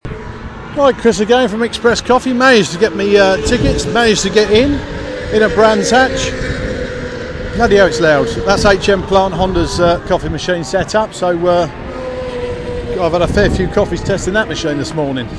coffee machine; honda's; brands hatch

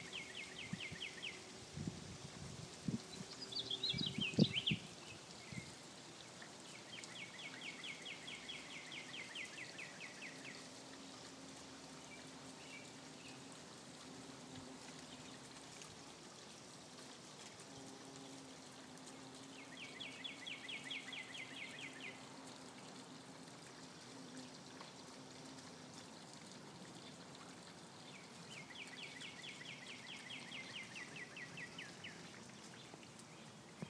relaxing sounds at home

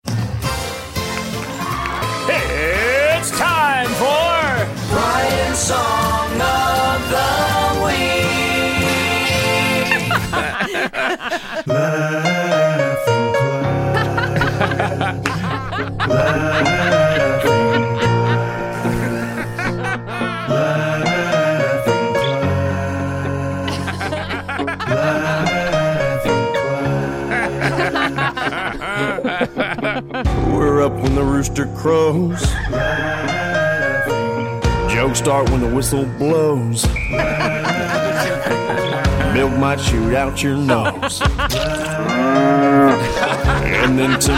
laughing class song

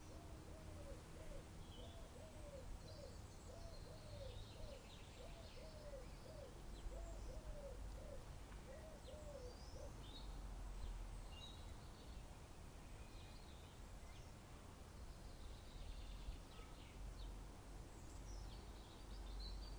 early spring birdsong

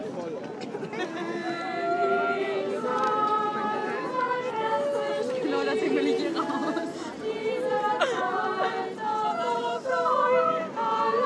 carol singing at the weihnachtsmarkt hamburg

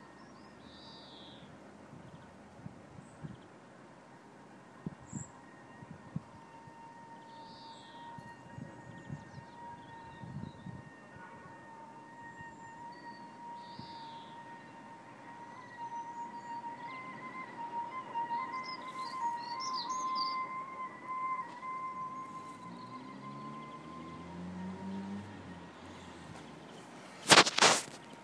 mystery noise

